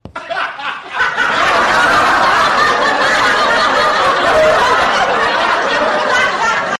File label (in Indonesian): ketawa; bajaj